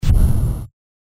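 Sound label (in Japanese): 炎魔法 小, 魔法効果音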